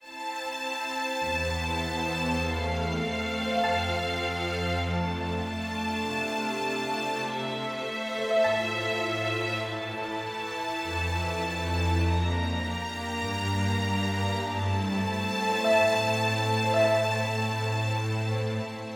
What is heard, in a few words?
adventure forte